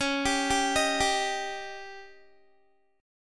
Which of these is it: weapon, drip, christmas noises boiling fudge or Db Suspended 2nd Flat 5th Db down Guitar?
Db Suspended 2nd Flat 5th Db down Guitar